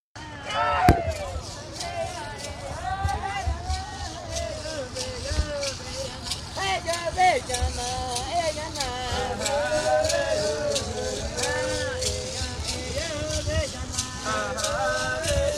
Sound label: pankararu